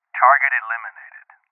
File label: Sniper Shot; This Is the Police 2